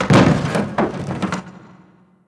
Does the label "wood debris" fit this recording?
Yes